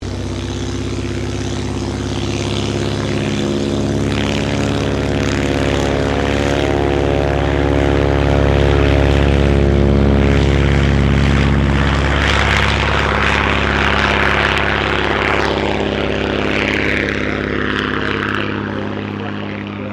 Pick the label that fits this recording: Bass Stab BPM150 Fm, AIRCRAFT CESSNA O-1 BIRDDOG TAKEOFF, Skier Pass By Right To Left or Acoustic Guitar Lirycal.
AIRCRAFT CESSNA O-1 BIRDDOG TAKEOFF